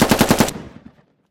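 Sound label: warning burst from a machine gun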